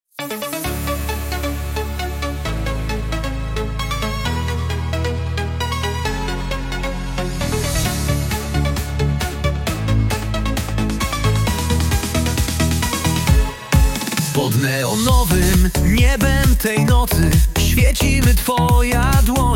disco polo

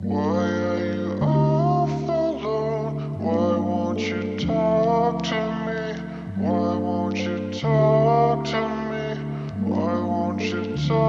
Chillout Funk Fusion

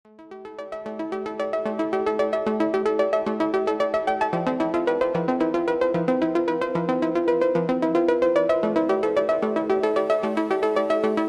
Electronic Impulse

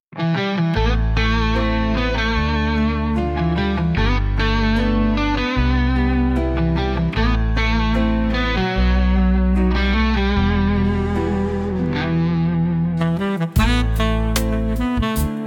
midnight sax

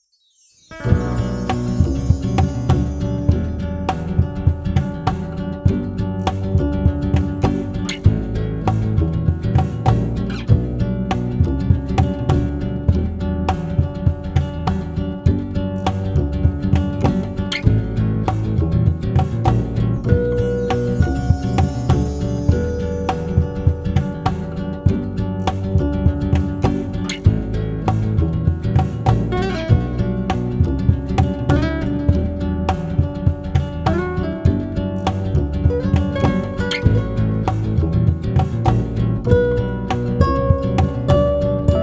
flamenco vibes 432 hz

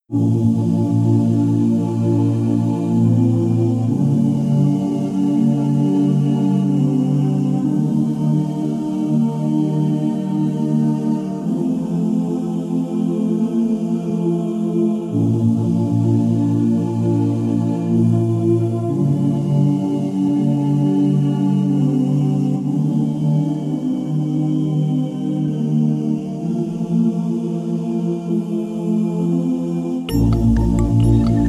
african rhythm 432 hz

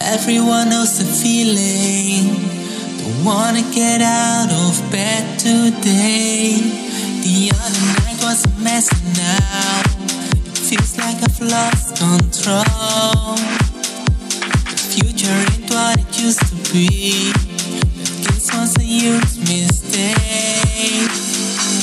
Funky House Vibes

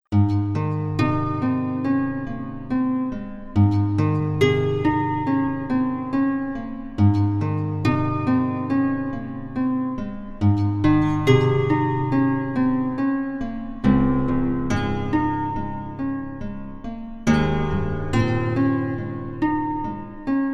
hawaiian guitar, 432 Hz; purity of nature; Grota solna

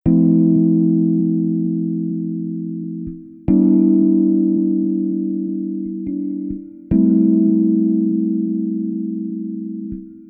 gentle sound 432 hz

